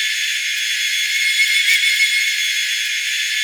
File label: Trans off high, Kart